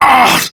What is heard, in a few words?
Pain Prime Grineer Male; Tau; Battle Barks